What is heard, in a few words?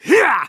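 ATTACK; DARK JEDI M; Star Wars Knights Of The Old Republic I; XBOX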